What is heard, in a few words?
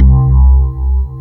pluck C2, FM pluck